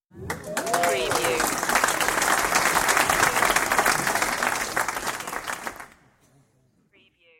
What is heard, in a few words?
Audience Applause; beanstalk audio